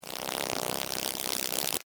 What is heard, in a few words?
Insect Wings Fly, Steady, Bug; Animal; Buzz; BlastWave